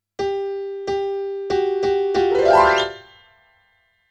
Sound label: PIANO, RNR for piano